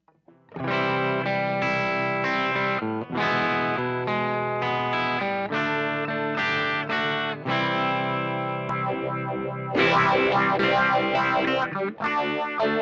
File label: phase 90